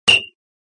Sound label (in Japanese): ピコピコハンマー, 戦闘; 音人